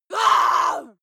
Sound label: female lunatic scream short